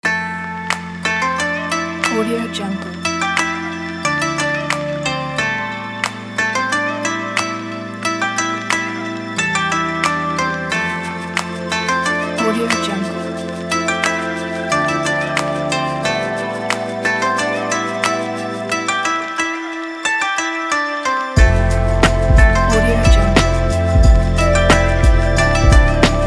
japanese beat

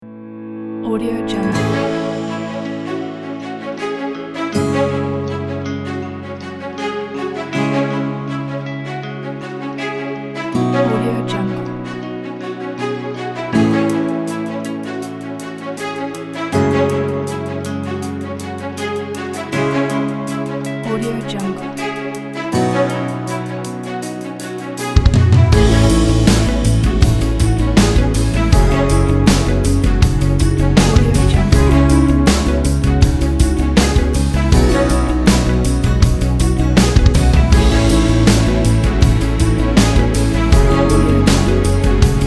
inspiring presentation

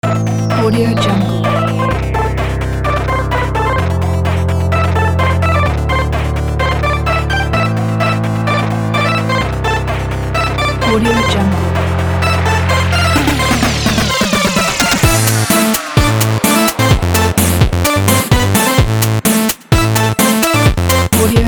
chiptune

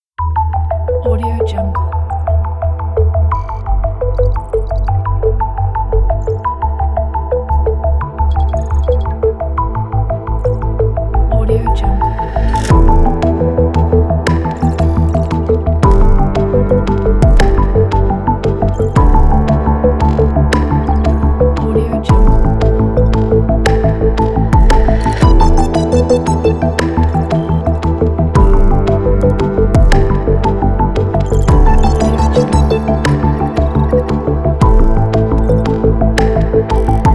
technology and digital future

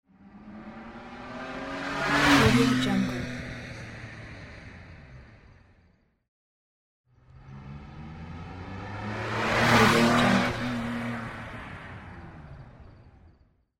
racing car sound effects

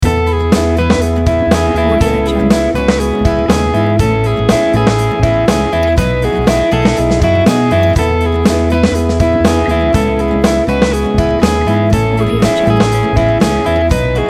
pop rock drive corporate

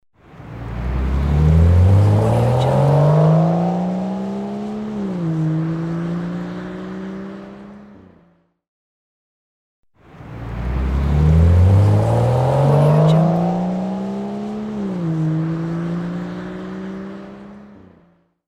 turbo car leaves away; soundfood

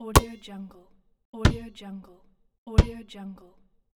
basketball catch

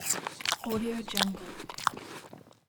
obnoxious loud food chewing; stormwaveaudio

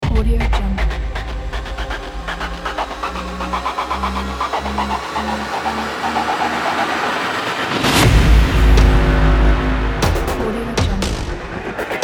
industrial trailer intro

